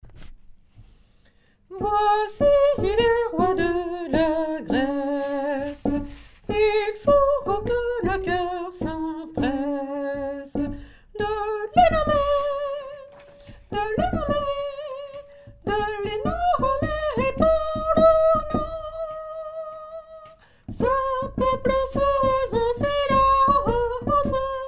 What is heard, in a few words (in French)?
soprano, chorale